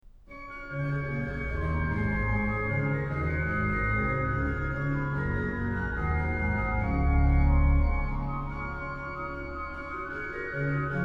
Vom Himmel hoch, da komm ich her, organ; BWV0606; BACH; BACH ORGAN WORKS; CHORALE PRELUDES

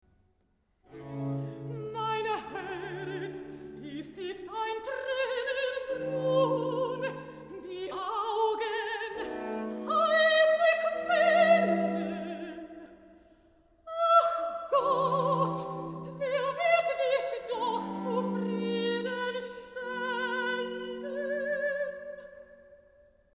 BACH for ALTO; vocal; BWV199

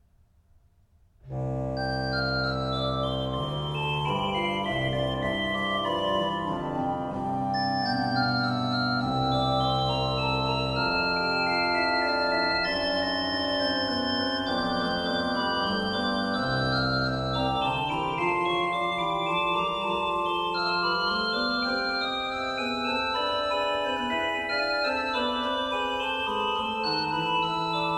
Prelude in C major, ORGAN; BWV567

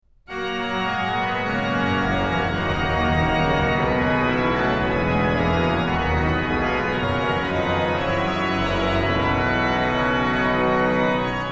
Gelobet seist du, Jesu Christ, organ; BWV0604; BACH; BACH ORGAN WORKS; CHORALE PRELUDES

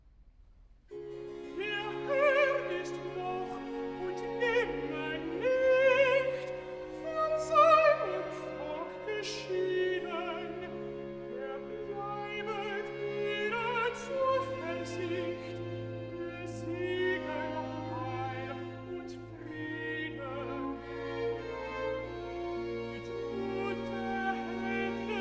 Recitativo (Alt), BACH for ALTO; Der Herr ist noch und nimmer nicht

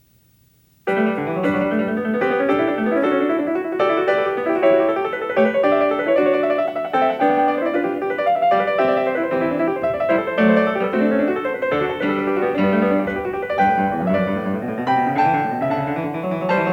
Variation 1 a 1 Clav., PIANISTS

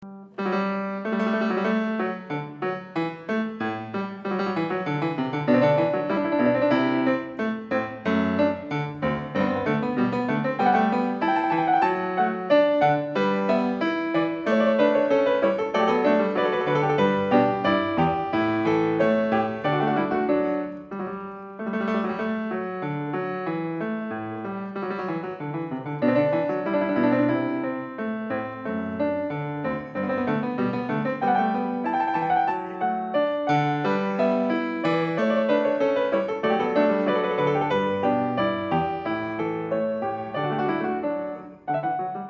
Goldberg Variations a 1 Clav Fughetta; BACH

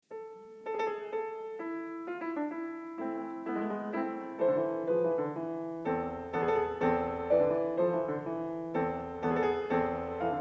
Sarabande; Partita 3 in a; BWV827; Bach; Partitas

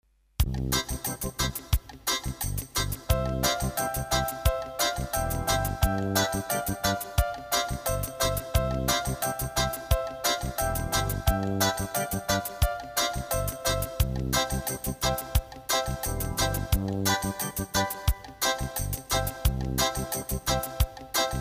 Instrumental, karaoke, Bulgarian; Bulgarian Folk Songs; Children

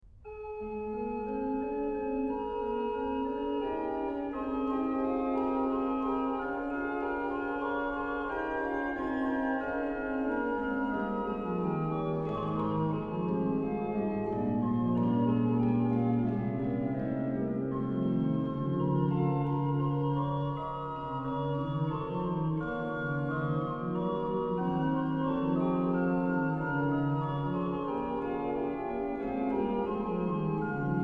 organ; Kyrie, Gott Vater in Ewigkeit BWV 672; BACH; BACH ORGAN WORKS; CHORALE PRELUDES

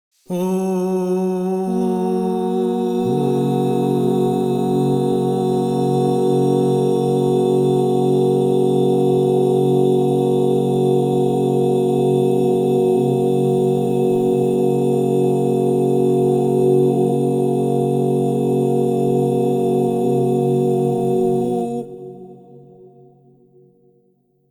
Fa-diez major, crystal bowls; Yoga and sound

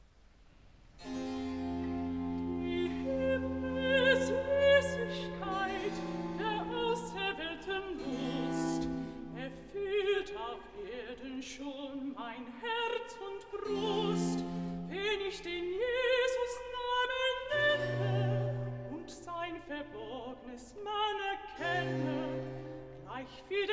Recitativo (Alt), BACH for ALTO; Liebster Immanuel Herzog der Frommen; Die Himmelssьssigkeit der Auserwдhl

